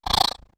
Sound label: spider attack; Minetest; VoxeLibre; mobs mc